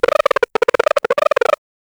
tiny cartoon footsteep in, snow

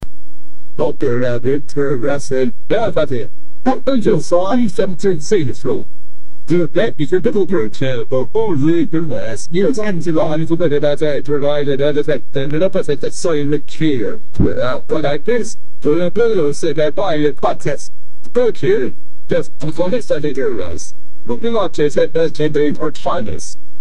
suspense quiz music

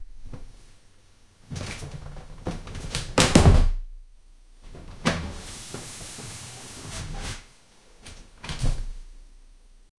closet opening and taking, rummaging

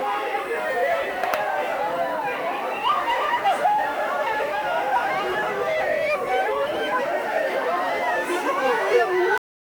joyful indistinct voices, young people

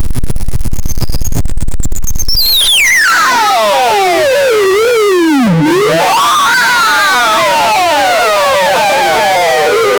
angels bowling in heaven

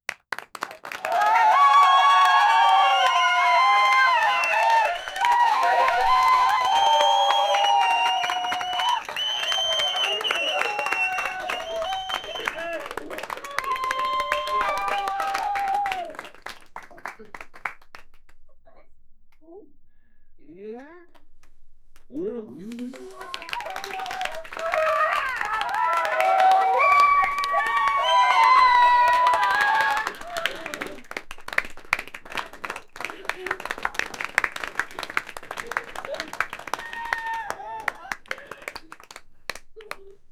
natural around 20 people, reactions

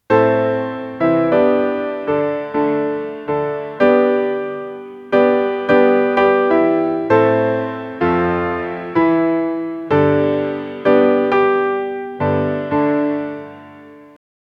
romantic dance piano song

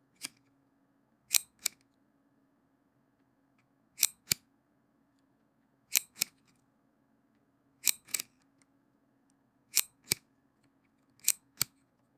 the sound of flipping thr; editing

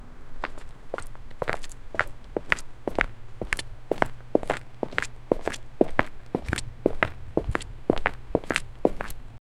footsteps noises made by, bridge

